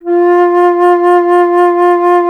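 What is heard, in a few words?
flute, alto flute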